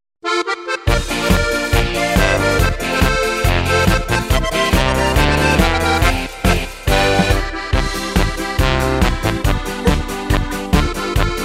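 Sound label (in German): Playback Chor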